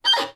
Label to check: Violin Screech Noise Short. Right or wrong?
Right